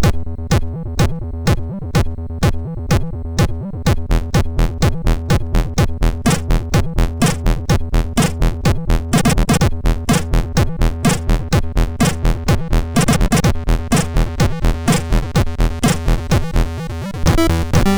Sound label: Acid at Night, sid MOS6581